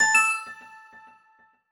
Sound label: complete chime